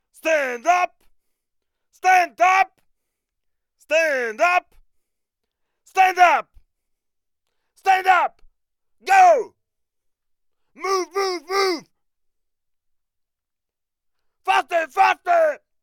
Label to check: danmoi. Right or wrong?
Wrong